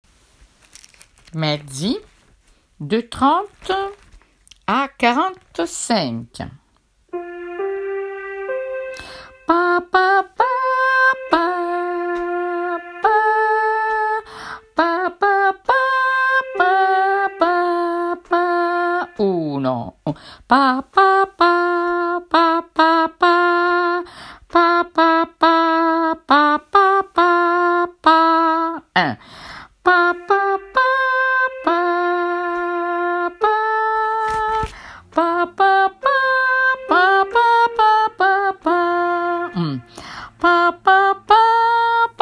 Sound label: mezzi lullaby